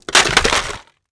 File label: fence light wood; World of Tanks Blitz